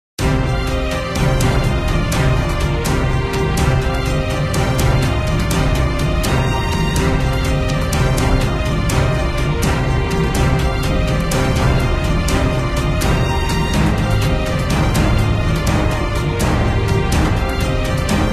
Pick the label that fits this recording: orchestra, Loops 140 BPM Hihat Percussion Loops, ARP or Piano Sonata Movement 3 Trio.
orchestra